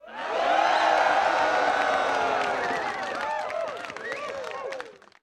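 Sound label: Crowd 100 Interior Cheer; medium int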